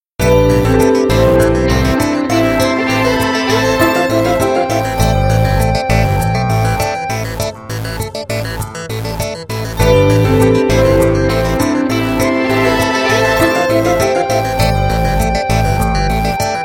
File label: Loop All Parts Unmixed